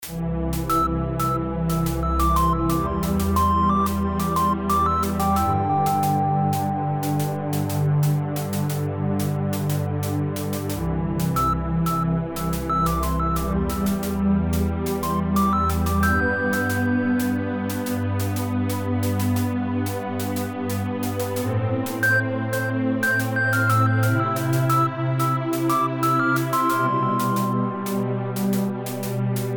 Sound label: Bells And Strings